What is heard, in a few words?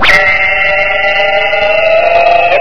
houndeye attack; source engine; hl1; video games